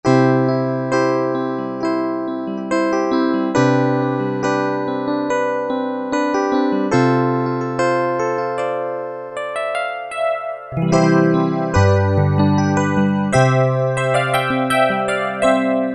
EP, Yamaha TG500

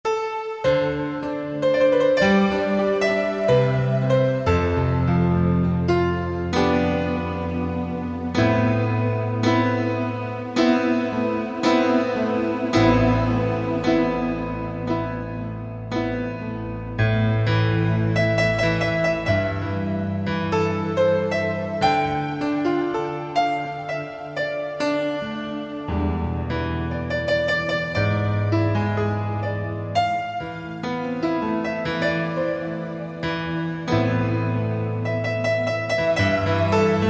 Piano Pad, Alesis QS8; Factory Preset examples

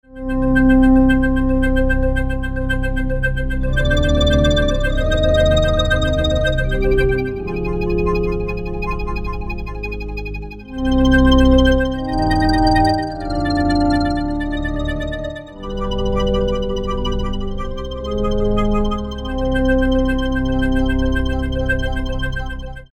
Nervous, E-mu Proteus VX

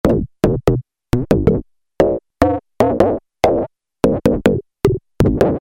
Yo Yo Drum; Alesis airSynth

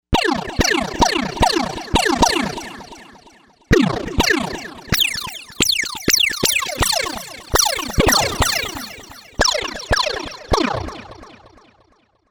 Laser Cannon, Novation UltraNova; Factory Patches

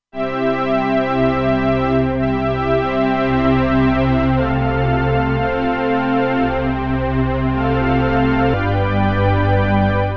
Synth Orchestra, Roland Alpha Juno-2; Original Sound Chart patches

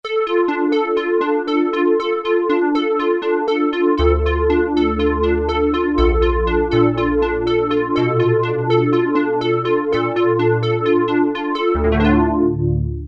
Square Pad, Roland JX-3P

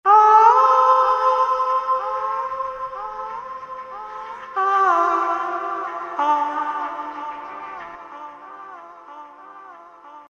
Ahooh....oh aah...ah.....; Ueberschall Easy Listening

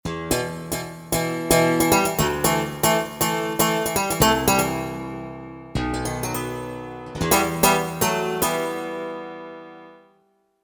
Syn Strat; Factory Patches

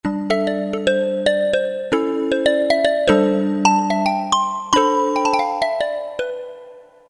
Vibe, Roland MT-32

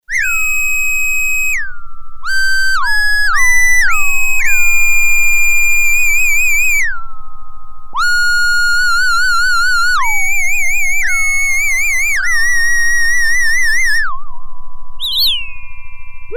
Whistle With Glissando; Sequential Circuits Prophet-5 (Ver.3); Factory Patch; File 2 Programs

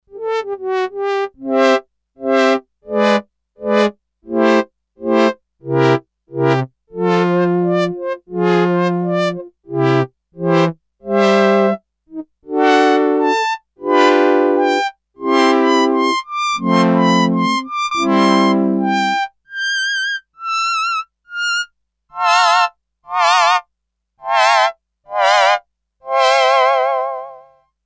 Brassy vibrato, Sequential Circuits Six-Trak; Factory Programs

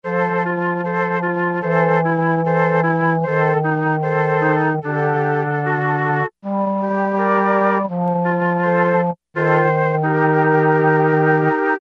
Alesis Z4 Vintage Keyboards; StrawField

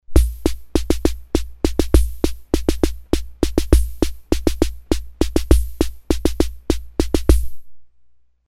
MIXED, Ace Tone Rhythm Ace FR-2L

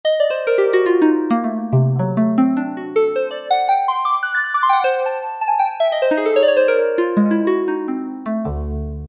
Jazz Harp, Kawai K1m; Factory patches - I banks

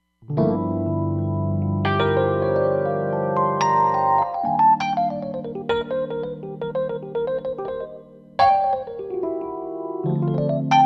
EP mkI

